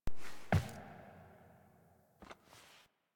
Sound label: Foley Step Single; Cinematics; Warframe; The War Within; Queens Lair